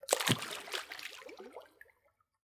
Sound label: heavy splash; soundscrate